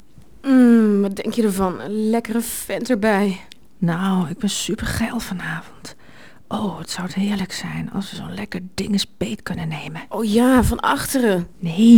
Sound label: Trio